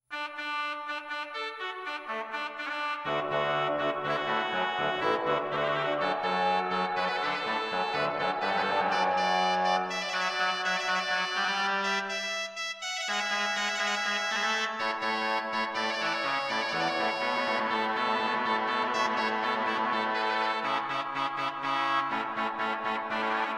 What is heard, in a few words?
organ duo